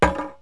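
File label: heavy drop, cartoon; Wired for Sound Pro CD; Aristosoft, Inc.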